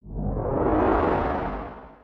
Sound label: Cam Login to Select